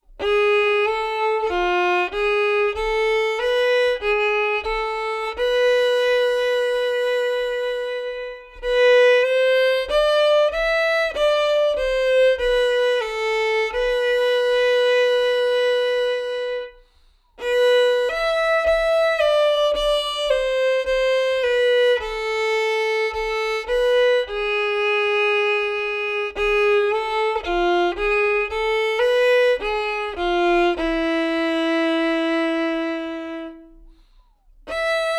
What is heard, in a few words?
zemer atik melody slow, fiddle